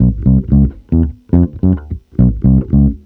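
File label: BASS 2, 110 bpm, Funk; USB Soundscan vol.41; AKAI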